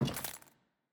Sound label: Footstep Plates